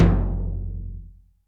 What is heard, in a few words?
TIMPANI, PERCUSSION; Crypton - DrumBase5000